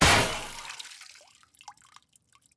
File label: Pipe Break